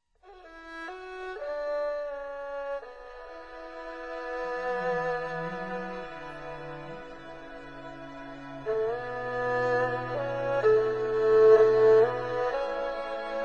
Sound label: erhu, chinese